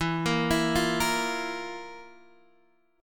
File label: F Major 7th Suspended 4th E